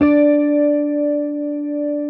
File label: Hamer 12, SUS, D4, Bass; Sampleheads; Will Lee Bass Library